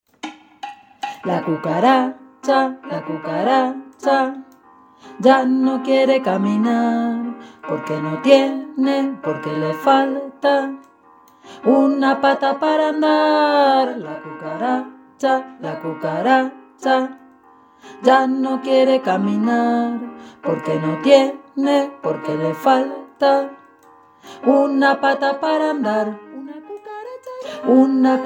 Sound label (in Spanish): la cucaracha tenores; Turín